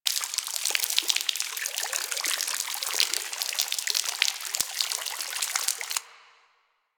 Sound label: Ocean Drip Stream Medium Loop; Ambience; Props; Warframe; Grineer Ocean